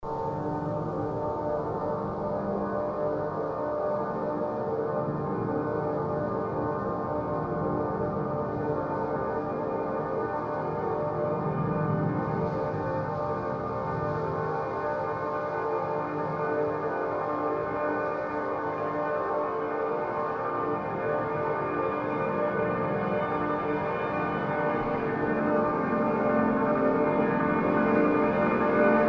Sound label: drone